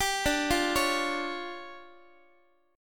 D Minor Major 7th Double Flat 5th, D, Guitar; DmM7bb5; arpeggiated; JGuitar

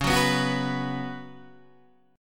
C sharp 7th Suspended 4th C sharp, Guitar Open D

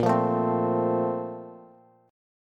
A sharp Major 7th Suspended 2nd, Bass; A#M7sus2; strummed; JGuitar

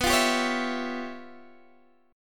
Db 7th Sharp 9th Flat 5th

